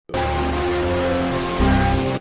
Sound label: windows error funk